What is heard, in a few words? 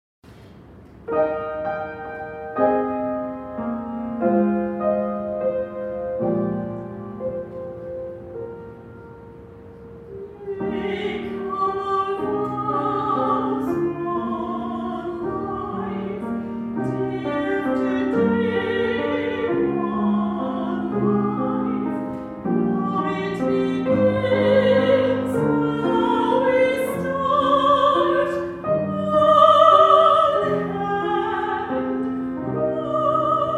soprano